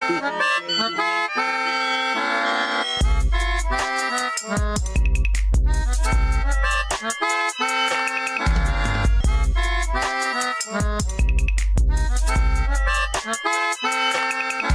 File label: Bad accordion music